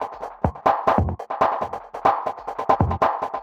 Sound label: TECHNO EXTRA 140